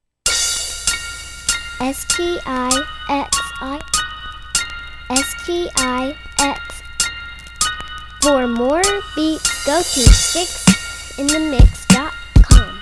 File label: brass funk